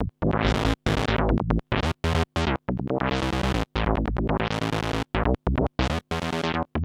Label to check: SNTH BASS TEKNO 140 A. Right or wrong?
Right